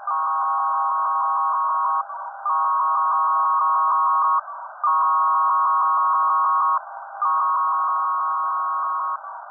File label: G-TOR 300 Baud; Betriebsarten